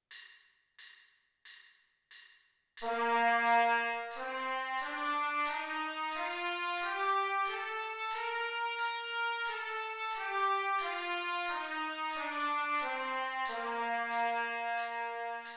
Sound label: c major scale trumpet